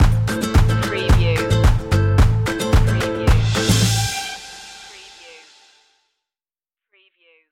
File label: latin stomp stinger